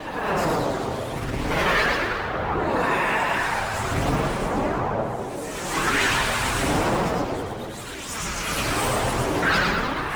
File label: Orbiter To Lair SFX Void Tunnel Loop; Warframe; The War Within